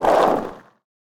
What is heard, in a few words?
skateboard roll push; smite; osiris